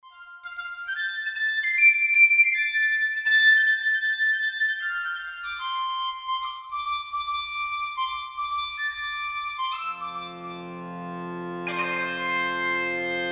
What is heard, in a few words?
pentatonic example